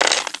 coin tabl

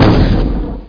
GAS POP

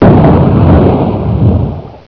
EXPLOS

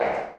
foot crow

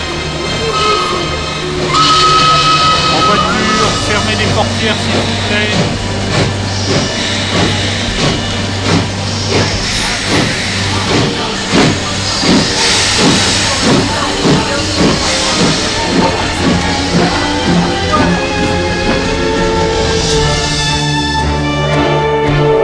train start; The Last Express Demo

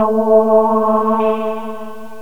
echo drops, synth sfx; Software Explosion 2 - Virtual Computer Pets; yamaha xg

